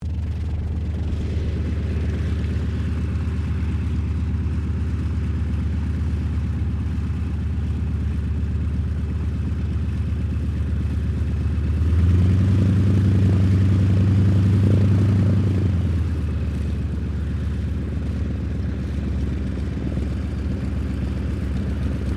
AIRCRAFT YAKOVLEV YAK-52 TAXI SHUTDOWN, Russian Yak52 Training aircraft; Airplanes